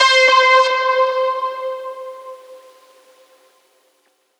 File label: synth note light C; Virtual Riot; lightdrifter